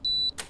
keycard

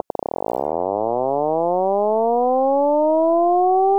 clicks

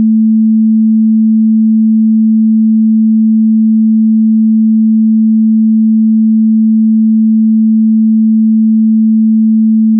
sine 220

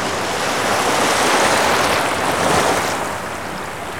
seashore

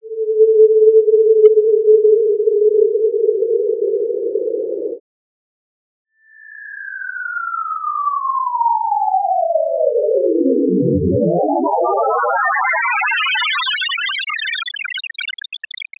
grain

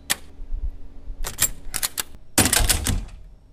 open latch close

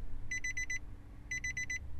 car door open

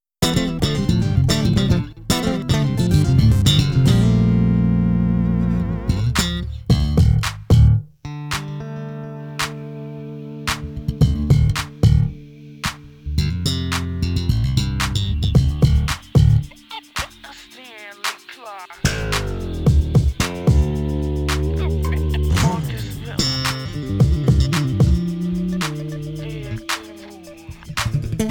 Thunder Intro

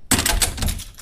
latch loud

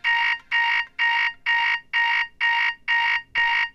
8 beat alarm loud

